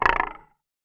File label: Box & Ruler, contact mic